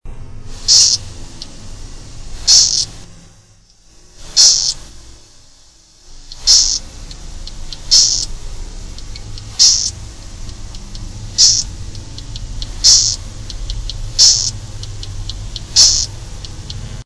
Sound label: D variabilis, chants